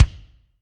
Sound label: Kik Reggae, Alesis D4, Drum Machines